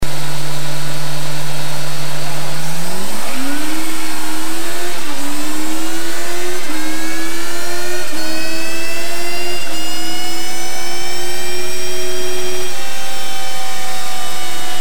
Honda CBR1100XX

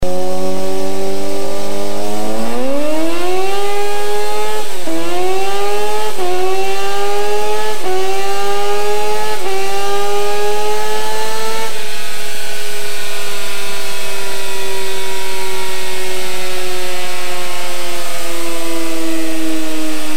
Honda CBR600F4 pipe; 1999; erion; 101hp

